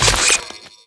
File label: emp missfire; zaero; items; empnuke